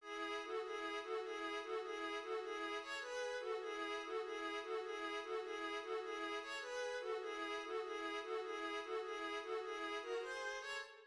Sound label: VIOLINS